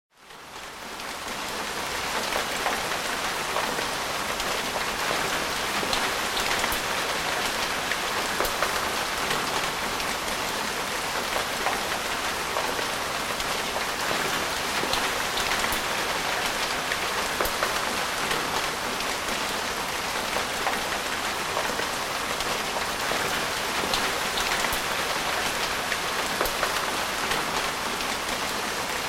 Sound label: Rain Real Ambi